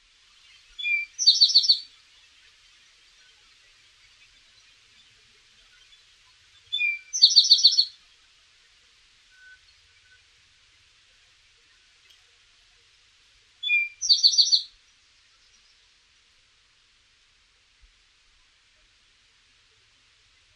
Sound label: eastern towhee